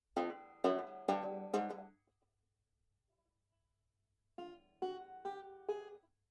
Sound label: tapping, banjo